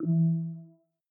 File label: Play Video; Peloton